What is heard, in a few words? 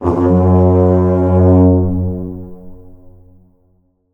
enemy horn; Aragami